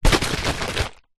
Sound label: food drink smoothy shake bottle half; zapsplat